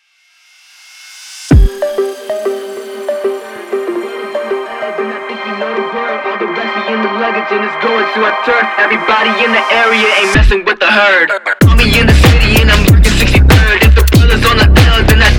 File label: phonk